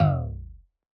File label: incorrect